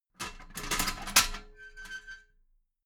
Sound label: metal ladder small handle unfold heavy